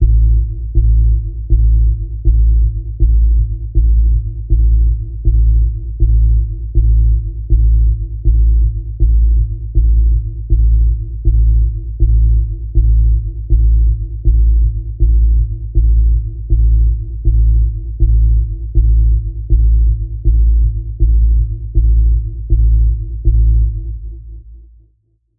Threatening Doom Pulse A, Key of A

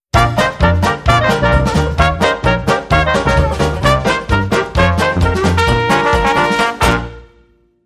Marching Line, Jingle

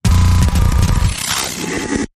Glitch Wobble Out

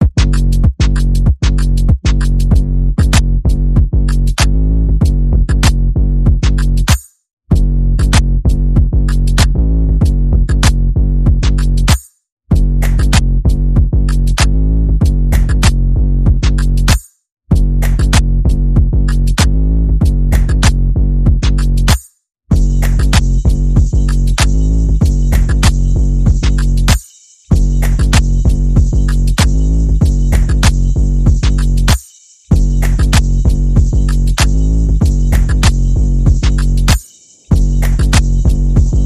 Percussion Bass and Effects

